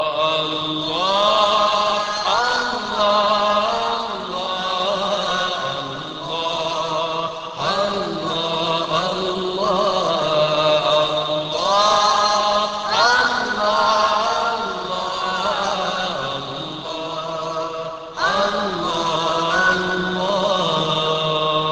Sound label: Allah Allah Allah